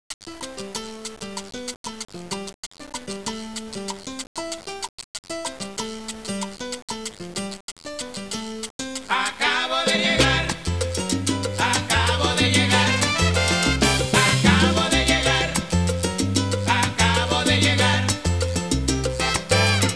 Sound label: Latin Music